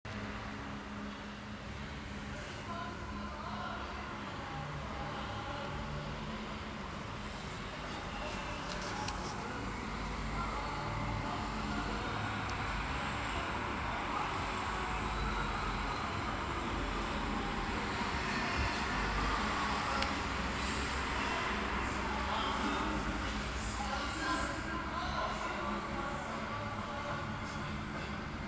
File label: eskisehir street after hours